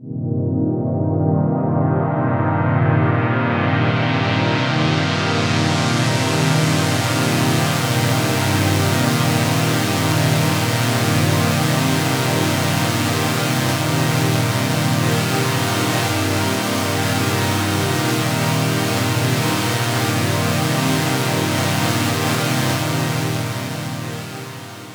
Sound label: Pad C2, Club Techno; Zero-G